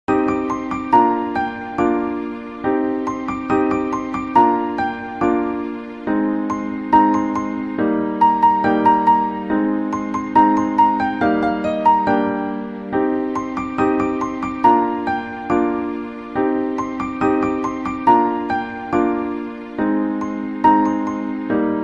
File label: song bgm ringtone, tamil bgm